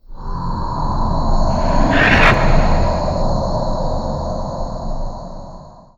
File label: snare spawn; weapons; dota; broodmother